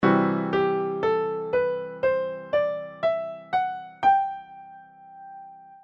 C lydian C chord

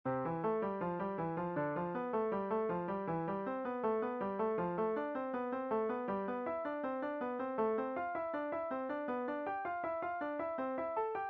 Five finger stretching, piano

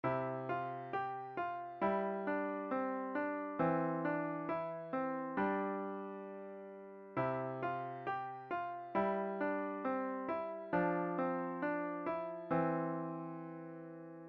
Coordination, piano exercises

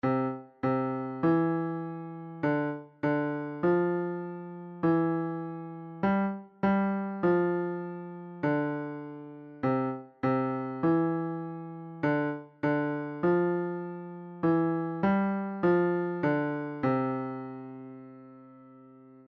jumping left hand, piano

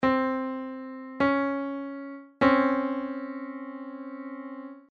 minor 2nd

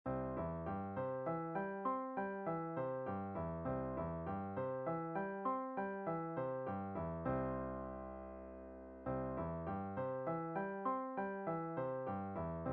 Arpeggios Exercise

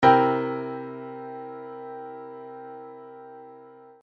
shell voicings 3rd

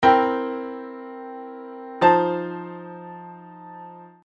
shell voicings voice leading

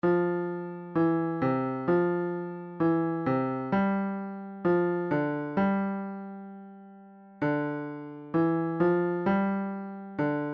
swinging left hand, piano

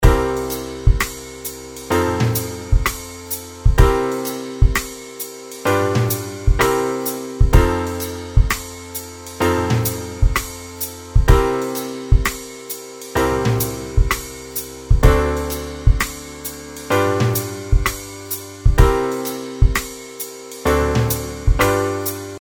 blues secondary dominants

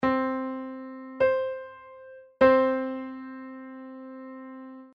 perfect octave

